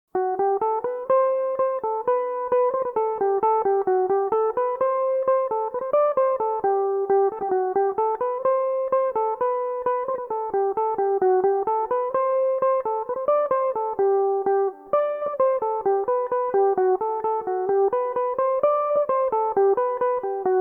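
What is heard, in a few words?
polka g major, mandolin; The Blasket Island Polka; Belmuse EM-190 electric mandolin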